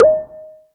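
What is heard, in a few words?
electronic tabla, drum machines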